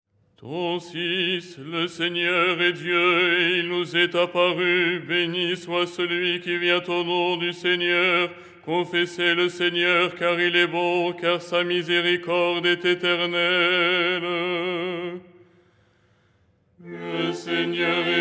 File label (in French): basse; Les 8 tons en français; ton6; Tropaire du dimanche